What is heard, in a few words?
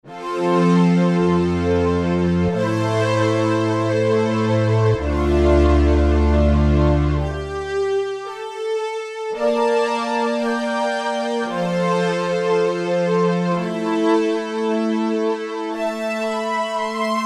Pad, Korg MSC-12S Synth; Synth 3; Zeus Pad